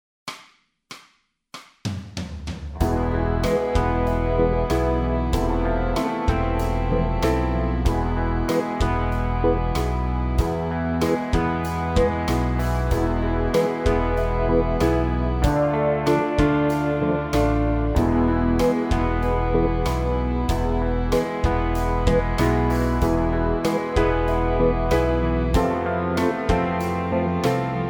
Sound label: guitar scales, backing tracks